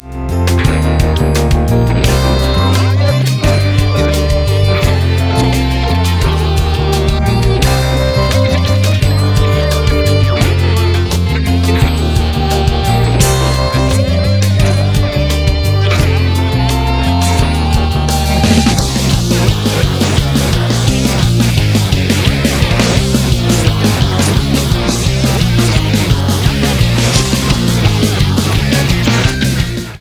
instrumental

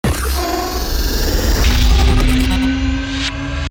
turbo hit complex long

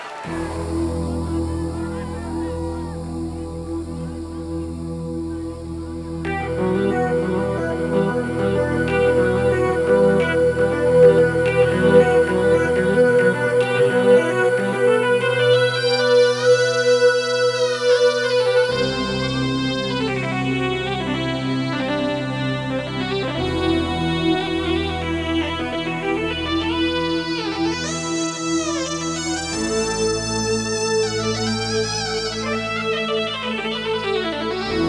keyboard solo

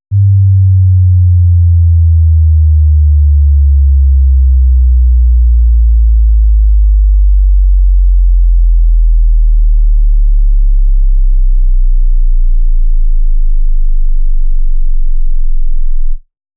sweep from 100 20

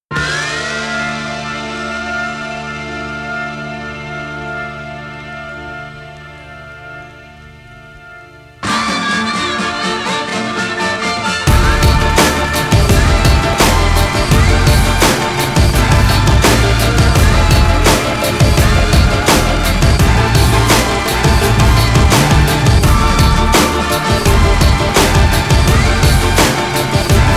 instrumental

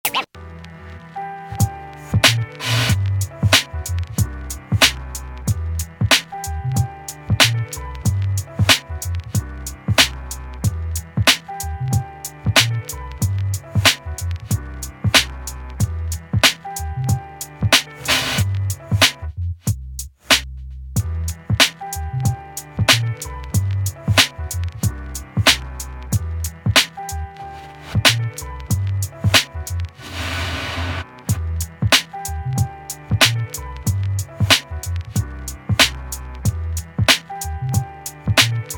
instrumental

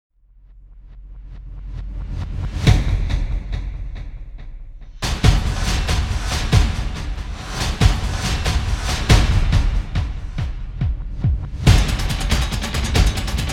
drums remix

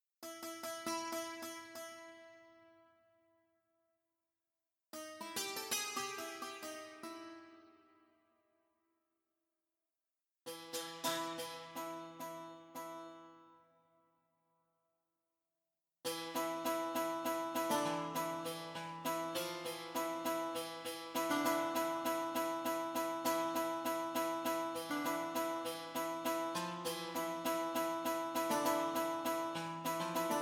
ethnic strings

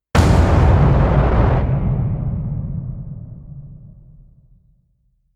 destruction slams; kraken

